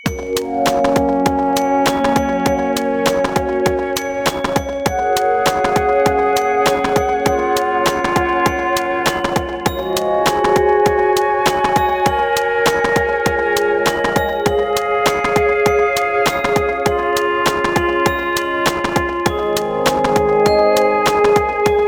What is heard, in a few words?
Glockenspiel Beat; Joyful